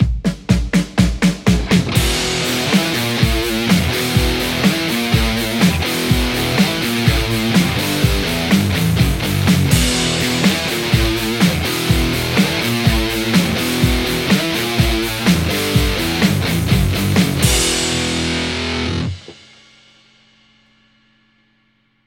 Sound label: lespaul; nickcrow wagnersharp